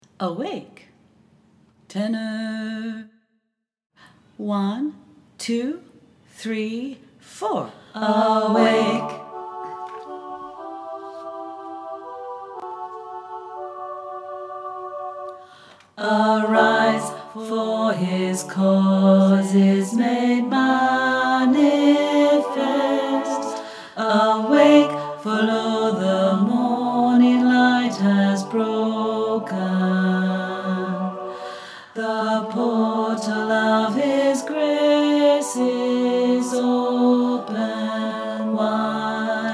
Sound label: tenor